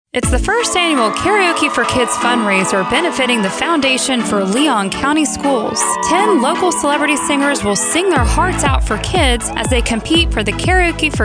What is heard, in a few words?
Karaoke; Leon County Schools; Karaoke 4 Kids